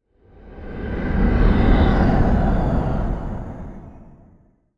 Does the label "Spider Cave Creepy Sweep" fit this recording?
Yes